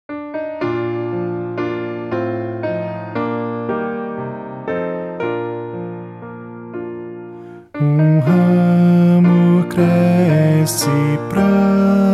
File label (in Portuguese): voz piano